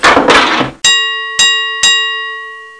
lever

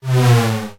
bat down; Geschicklichkeit; Bricks Of Egypt

